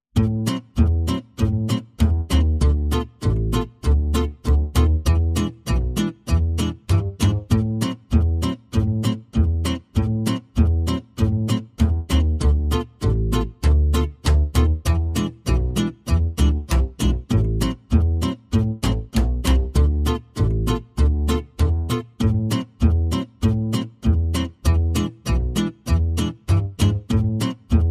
No Melody

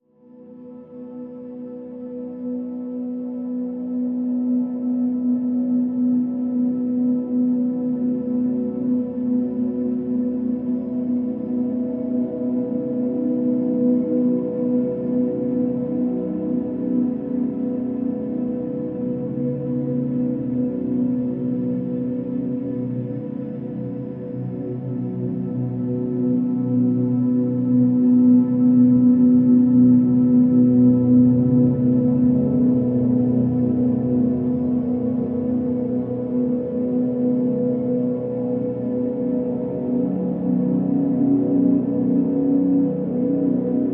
Pads Distant (B); Lights

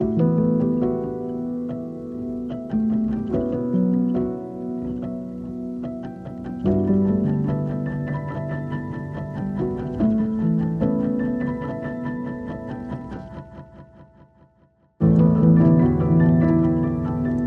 Piano Only

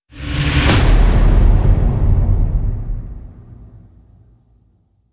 Hit

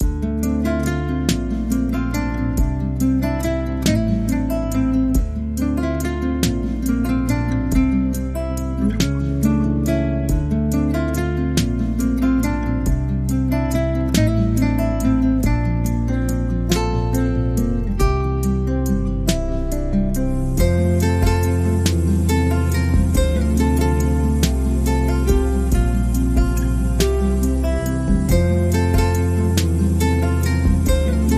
Waltz

